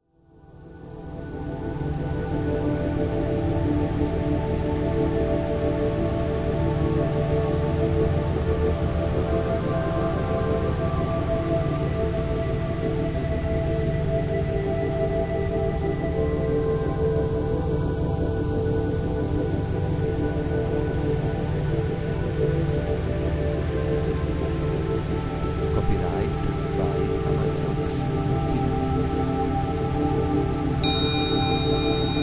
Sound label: meditation gamma